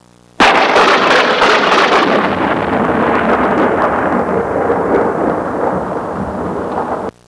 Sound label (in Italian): natura nature